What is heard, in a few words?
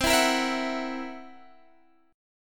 Gb 7th Flat 5th C